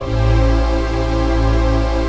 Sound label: CHRD PAD; Best Service; XX Large Pads; Sample CDs